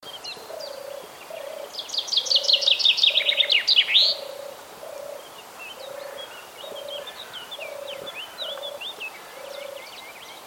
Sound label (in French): tourterelle bois pinsons